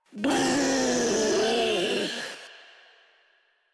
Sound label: Infested Ancient Vocal Attack; Enemies; Proto Infested Ancient; Warframe; Ancient Ninety Nine